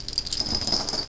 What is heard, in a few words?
m60 belt in, firearms weapons